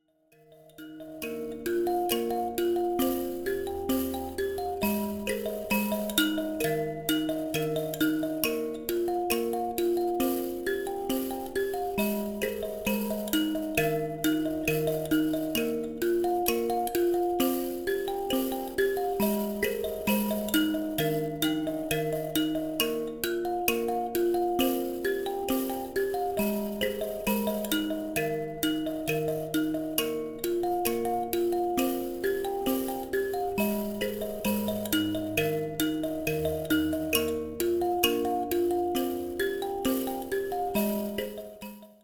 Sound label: mbira